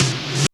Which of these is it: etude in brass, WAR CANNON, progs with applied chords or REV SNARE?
REV SNARE